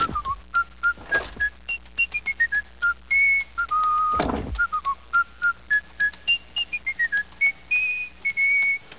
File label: whistle, african greys